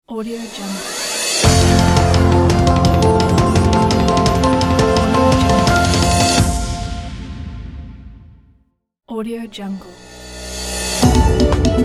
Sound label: breaking news logo pack